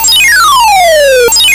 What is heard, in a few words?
Arcade Riffs